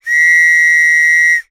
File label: scout whistle blow; ACME; foley